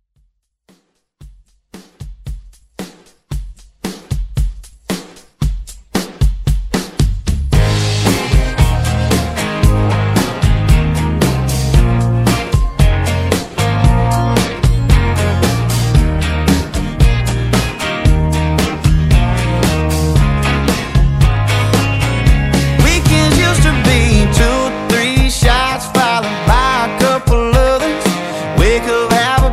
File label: Country Redrum